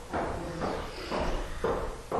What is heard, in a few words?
growl